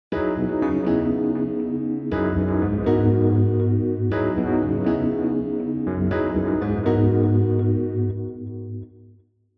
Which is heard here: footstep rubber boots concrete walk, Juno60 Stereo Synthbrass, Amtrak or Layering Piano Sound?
Layering Piano Sound